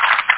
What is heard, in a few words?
trees; int golf